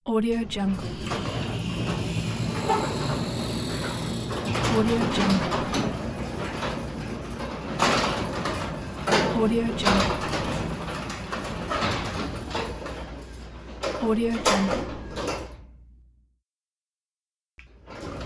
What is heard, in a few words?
automatic garage door